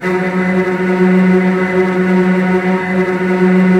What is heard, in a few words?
STR TREMOLO, Strings; ILIO; Kirk Hunter Virtuoso Strings VOL-3